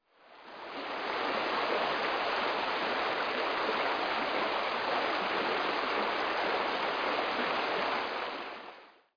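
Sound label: river ambience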